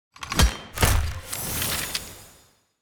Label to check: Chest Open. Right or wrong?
Right